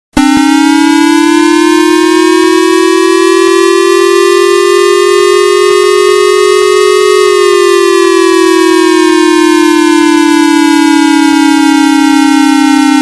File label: missile alert